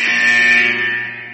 boss coming; DoomsdayRescue

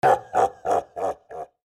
Zombie Laugh; ZombieBattle; audio zombie

